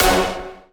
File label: punch F#5